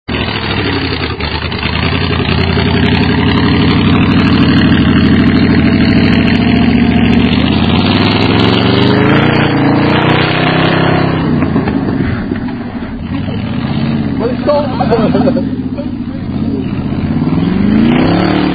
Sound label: test, v8 micra; magicspanner